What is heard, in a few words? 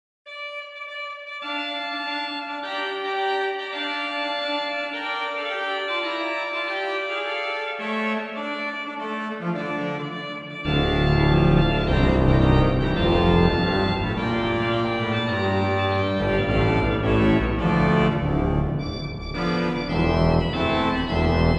Messe Noel, OrganMusic; Fessy & Mine; Souvigny